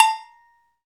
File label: AGOGO; Metal Menus